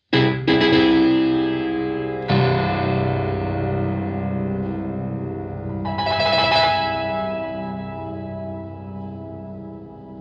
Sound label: terror, Terror; melodie; scene